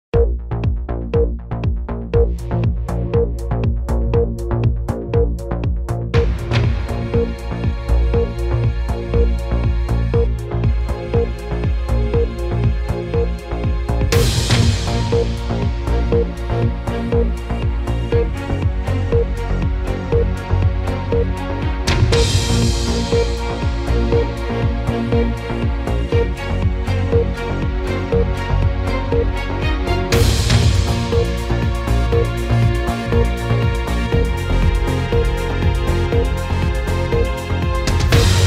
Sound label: BBC News Channel Countdown 60 Updated